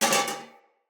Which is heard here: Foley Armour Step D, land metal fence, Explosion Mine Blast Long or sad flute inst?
Foley Armour Step D